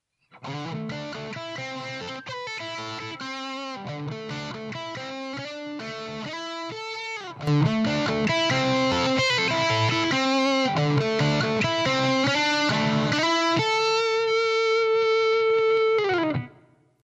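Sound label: Electric Guitar Distortion; Lindell Audio Compressor 7X-500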